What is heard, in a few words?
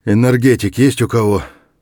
tired, voice; Escape from Tarkov